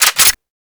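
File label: weapon foley de50 reload chamber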